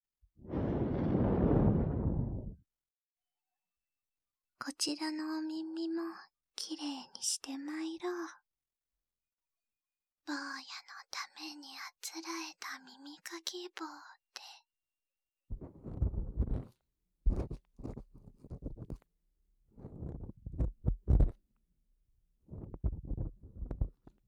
耳かき棒で、反対のお耳もマッサージ, ASMR

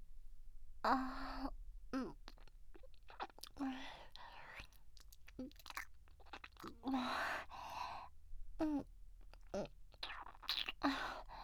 突き出した下同士を絡めるキス

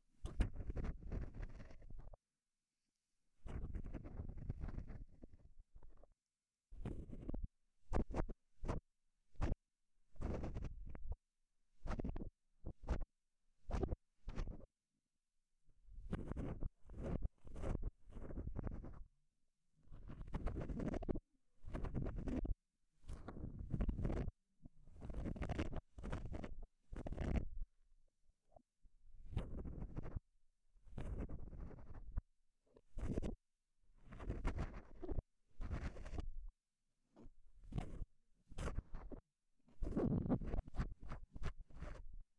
耳かき 左, ASMR

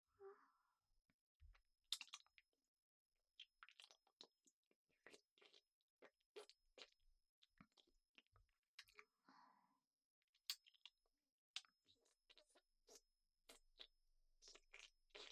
乳首舐め右; みみもと☆あだると放送局ver2; イヤホン必須; バイノーラル録音; 48khz24bitハイレゾ音源

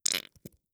射精音

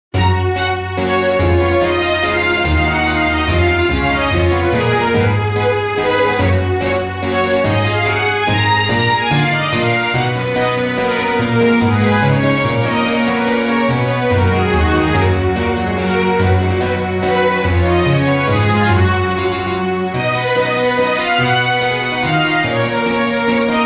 BGM; 秘密のご奉仕喫茶～小悪魔メイドの搾精サービス～

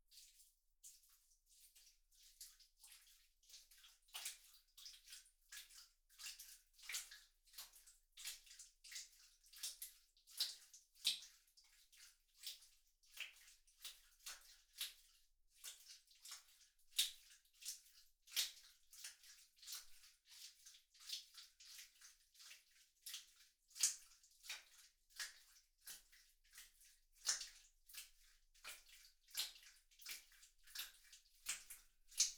クチュ音 棒 低速; 3DIO; アダルトな音

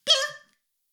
シコシコ音声, ASMR; 古き城のドラゴニート2

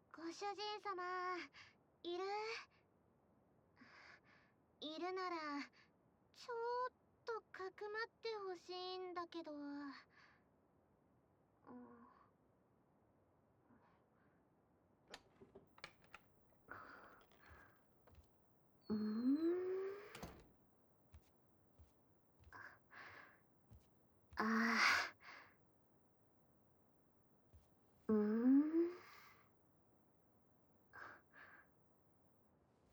お昼寝心音リラックス; 小悪魔ギャルメイドと

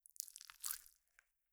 抜く音

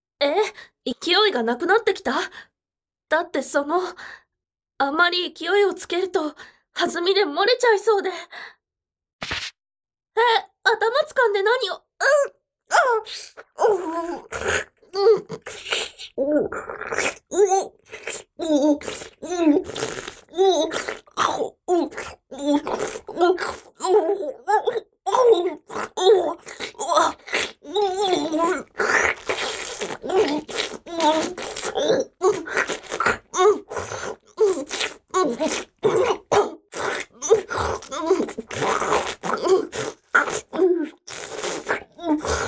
めそめそ グポグポ

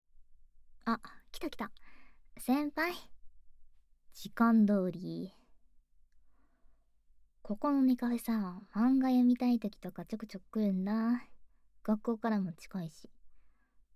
声我慢囁き耳舐め乳首責め, ASMR; ネカフェでバレないように

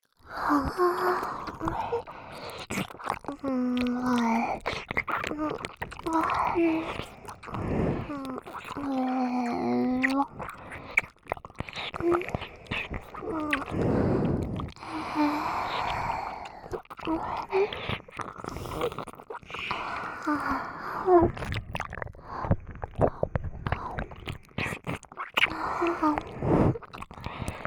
両耳舐め

